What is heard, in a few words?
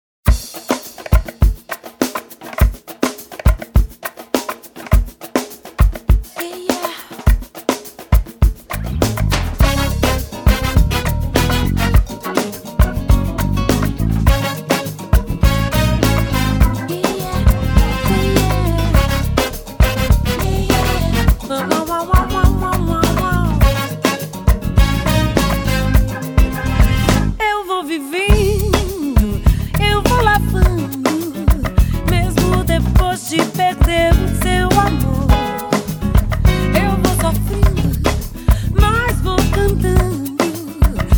Funk